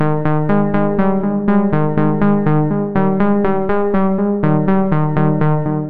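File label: 122 BPM Plucks D major B minor Tonic D; mmb studio soundtrack essentials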